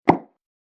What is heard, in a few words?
Tennis Ball Hit; Sports Sound Effect; Tennis Sound Effects; Andrew Fleming